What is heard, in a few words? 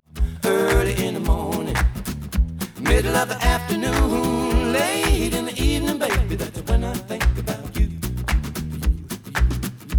Stereo output noised; 4bit